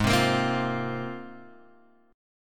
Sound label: Ab 6th Add 9th Ab, Guitar, Open G